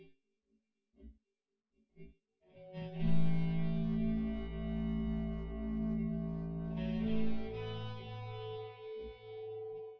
wdrums synthconv

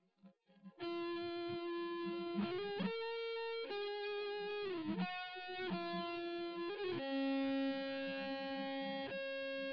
nodrums inst sim

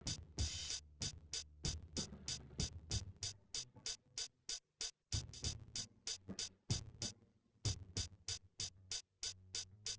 wdrums inst sim; SASSEC07

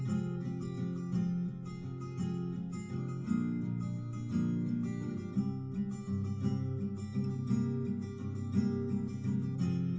no drums live rec 5cm; SASSEC07

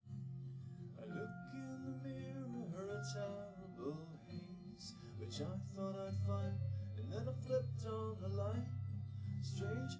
no drums live rec

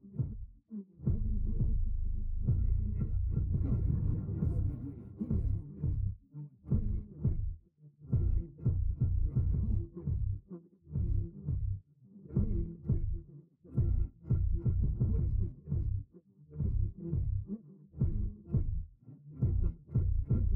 bass; SiSEC11

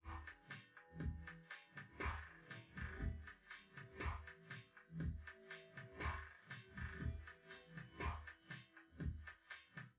wdrums

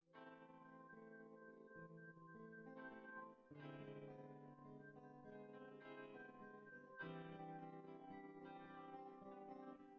no drums synth conv 5cm sim; SASSEC07

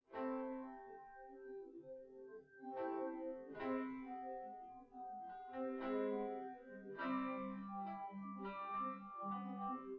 no drums synth conv 5cm sim; SASSEC07

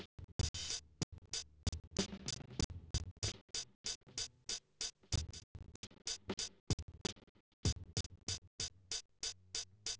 wdrums inst sim; SASSEC07

